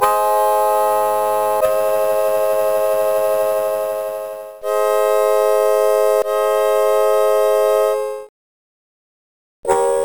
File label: harmonica